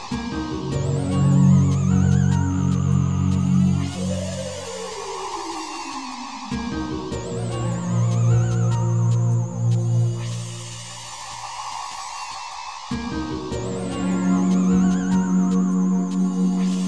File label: tune